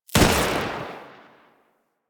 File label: Pistol Shot, weapons; Warframe; Thanotech